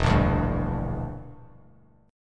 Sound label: E Minor 7th Flat 5th E; strummed